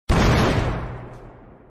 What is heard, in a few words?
generator explosion; dbd